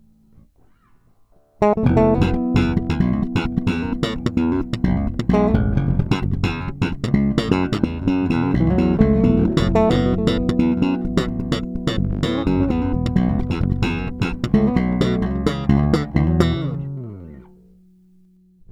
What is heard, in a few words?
play, bass